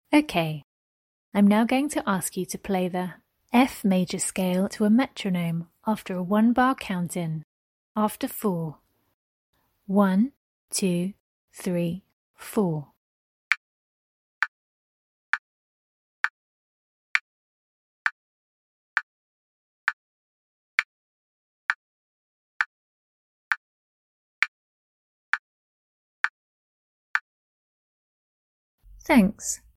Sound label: f major scale